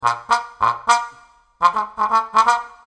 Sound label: Trombone